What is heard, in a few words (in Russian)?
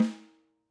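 14x6.5 латунь; lyudvig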